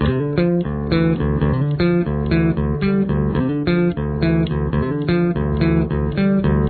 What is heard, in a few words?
bass